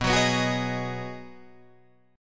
B 6th B down Guitar Drop D

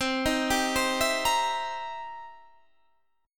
C Minor 7th Flat 11th, chord; Cm7b11; arpeggiated

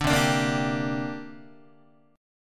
C 7th Suspended 2nd C down Guitar; C7sus2 strummed; JGuitar

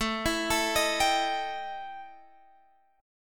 E Minor Major 7th Double Flat 5th A, guitar

